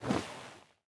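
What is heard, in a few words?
movement armor; smite; pele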